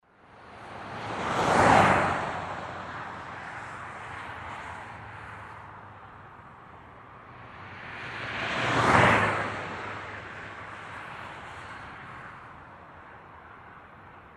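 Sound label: road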